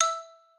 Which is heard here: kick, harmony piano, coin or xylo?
xylo